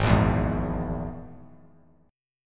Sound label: Ab Suspended 2nd Sharp 5th, Bass Standard; strummed; JGuitar